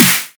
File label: drum hit clap